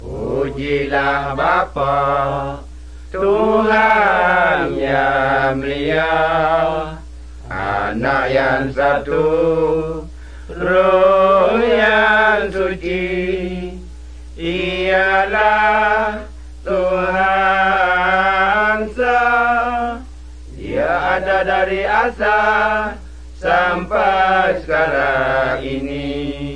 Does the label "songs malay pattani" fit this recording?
Yes